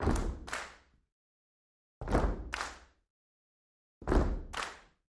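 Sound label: Crowd 50 Int Rhythmic, large int; medium int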